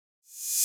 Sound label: Open Hat